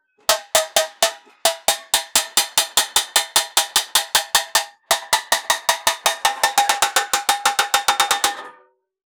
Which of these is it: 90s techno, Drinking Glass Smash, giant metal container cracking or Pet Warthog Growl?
giant metal container cracking